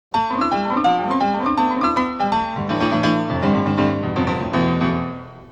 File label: piano